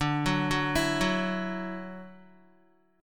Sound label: D-Major Add 9th D down Guitar; arpeggiated; JGuitar